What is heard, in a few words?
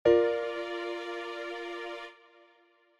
Bb Maj Inversions 2nd